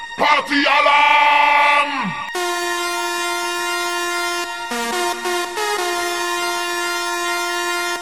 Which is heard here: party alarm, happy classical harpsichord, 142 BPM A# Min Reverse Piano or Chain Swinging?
party alarm